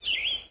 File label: forest bird, ambient; Source Engine; tf